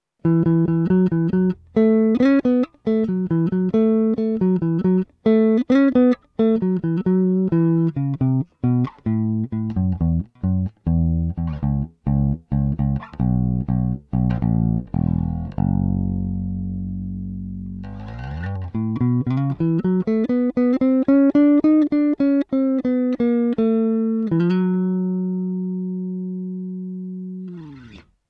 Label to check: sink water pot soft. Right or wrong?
Wrong